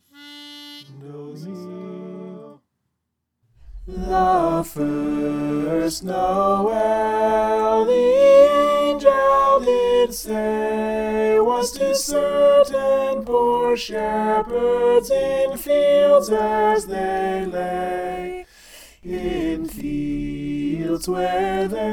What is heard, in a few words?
soprano